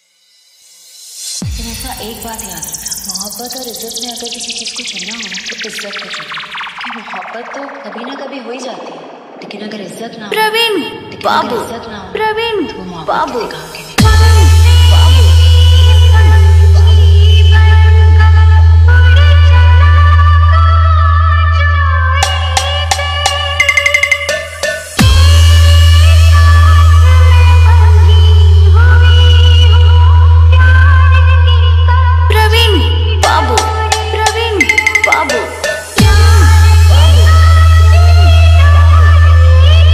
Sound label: Jhan Jhan Bass Mix